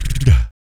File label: VOC PERC, Vocal Planet Jazz FX BASS PERC